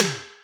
Percussions

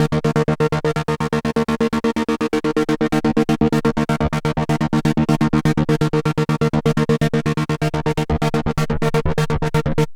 LFO 125, SYNTH SEQ

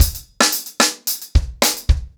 110BPM, ACOUSTIC DRUMS

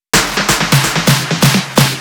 128 BPM Fill In, Drums & Perc; Vengeance - Essential Clubsounds Vol.5; Sidewalk Kit; Root G

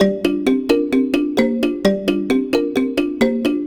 Marimba; Sonic Foundry (Sony Creative Software); World Pop; Percussion

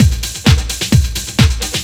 power drill; Zero-G Deep House; 130bpm

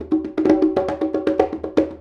Conga Fill; Essential Percussion

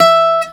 CG SOFT E4, C. GTR SOFT, Celtic Flavours; USB Soundscan vol.42; AKAI

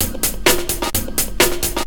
Straight Loop 128 BPM, House

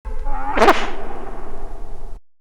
Wind

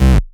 Basses Root C

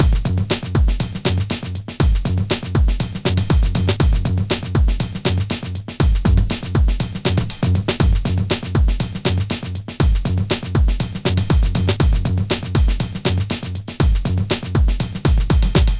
Processed Beat, Drums & Percussion

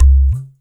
WD, DRM HITS; Spectrasonics; Liquid Grooves; WAVDRM HITS1

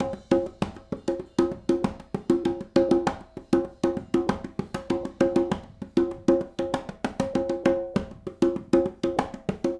Smooth Groove Congas, 70s Analog Funk; Sonic Foundry (Sony Creative Software); What it Is!

